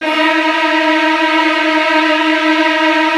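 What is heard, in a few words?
Virtuoso Strings, violin trills, D#1; ILIO; Kirk Hunter Virtuoso Strings VOL-1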